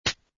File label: rotate